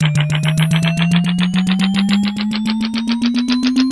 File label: Ui Rising Sound Number Going Up